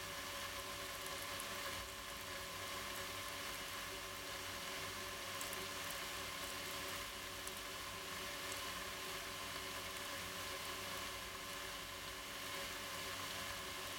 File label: pump100, aio; be quiet pure loop 3 lx 360mm